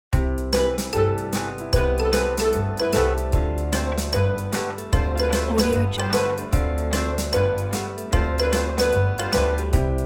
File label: tv show theme